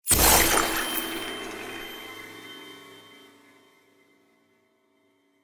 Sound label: frozen Block explode; Champions of Valhalla